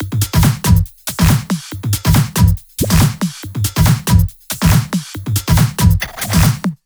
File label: Loops 140 BPM Hihat Percussion Loops